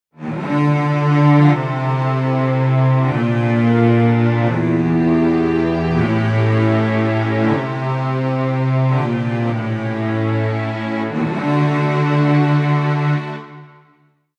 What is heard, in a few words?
Cellos Orch; Pa2x